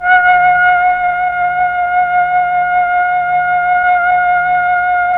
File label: FLT, Melotron Flt, Tron Flutes; Roland LCDP09 Keys of the 60s and 70s 1